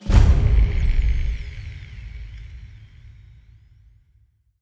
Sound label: UI Quest Surprise Pop Up